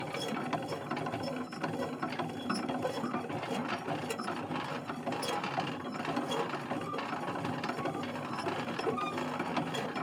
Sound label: engine loop, sci-fi sounds, mechanical